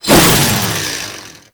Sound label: Chain Saw Tonfa Whoosh; Warframe; Grineer